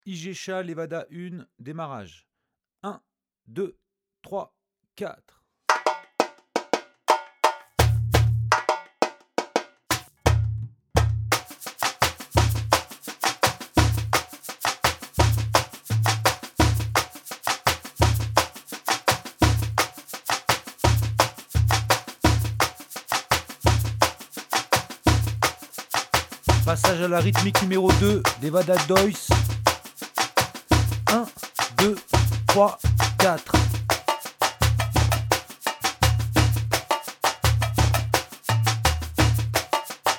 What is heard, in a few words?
ijexa level 1 no agogo